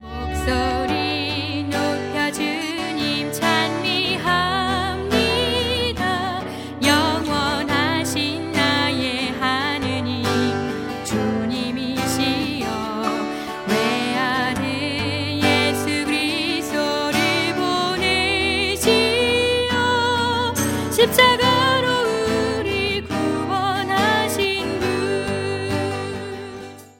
chant ringtone

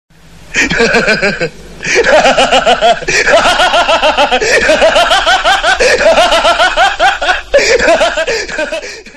laugh ringtone; android; gabbar

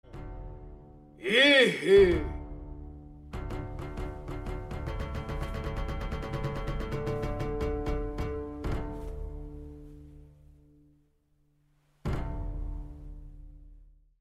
zen ringtone

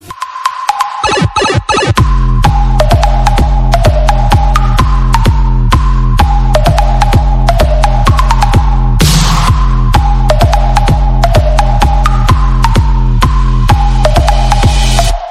mario bros electro ringtone

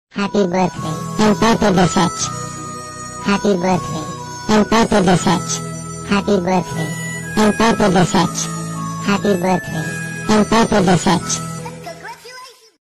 happy birthday ringtone

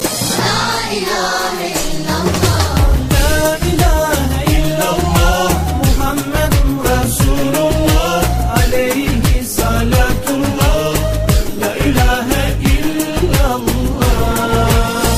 islamic la ilahe ringtone

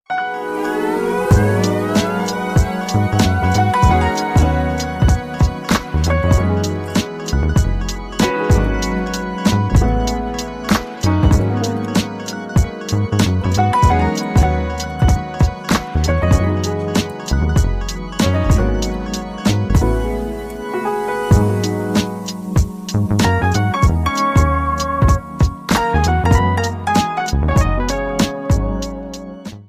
galaxy s22 ultra ringtone; samsung s22 ultra

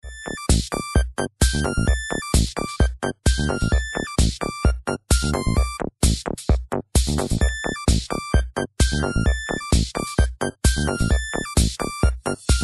retro ringtone

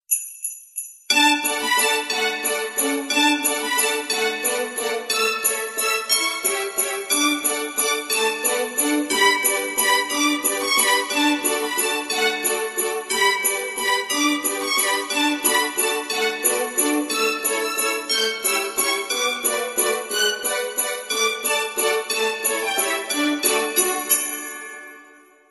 silent night ringtone

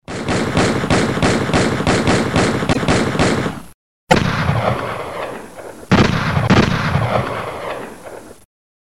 gun shots ringtone; android

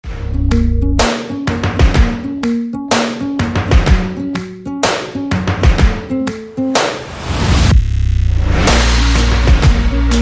soundtrack ringtone

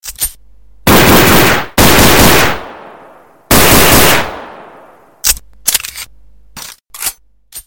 usb mix ringtone

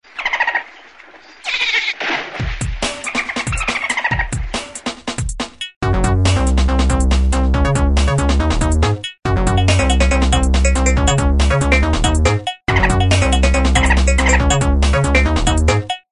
steel canvas ringtone; motorola

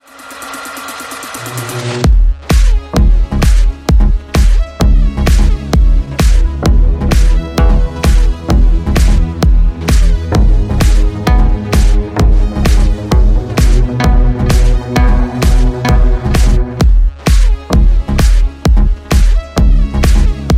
nice trance ringtone

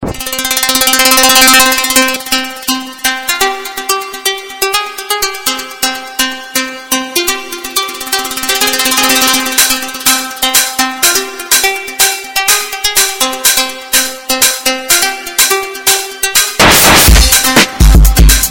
extreme loud ringtone; android

